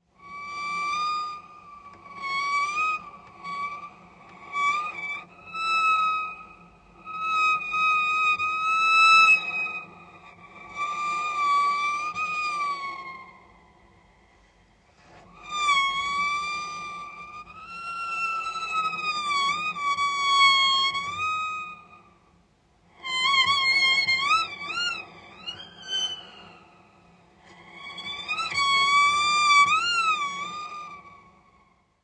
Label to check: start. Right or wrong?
Wrong